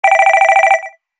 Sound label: Vintage Telephone, Ringtones; KE970 Shine; LG